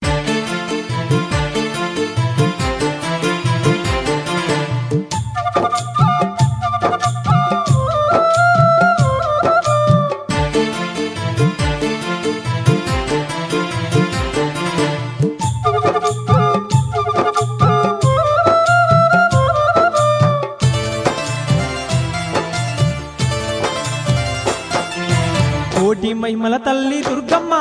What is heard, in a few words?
folk Music, telugufolk